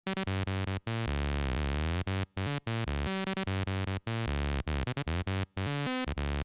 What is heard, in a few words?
notch lead